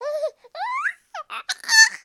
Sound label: human baby 3 months happy vocal; sound effects one